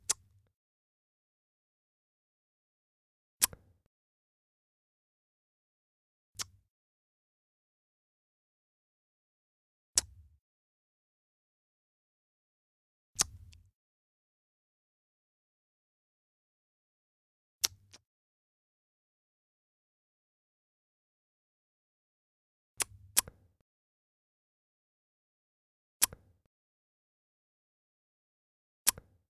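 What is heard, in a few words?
tongue clicking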